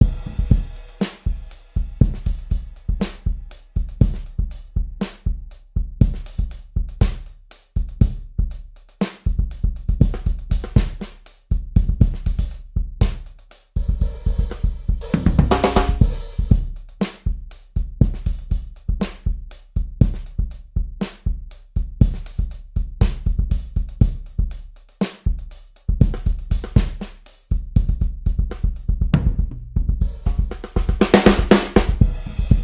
Drums; Atelier Escha and Logy: Alchemists of the Dusk Sky DX

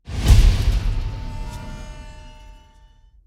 Achievement Bonus; I Shall Remain

